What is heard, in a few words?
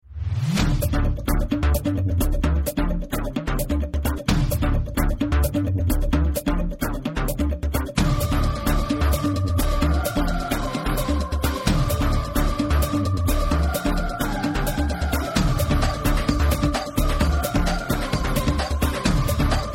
Police Theme, Chorus; Dandupalya